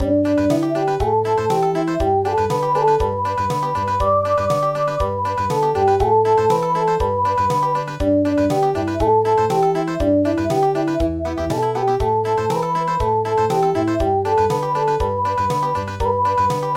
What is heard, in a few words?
Country